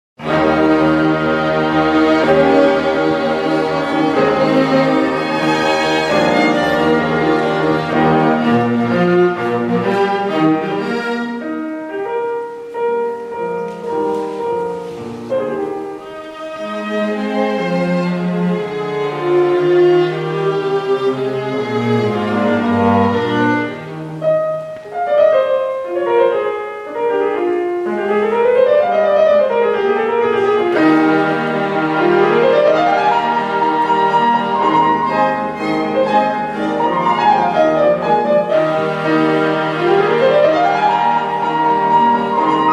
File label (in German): Klavier - Quartett KV 493 - Allegro; Mozart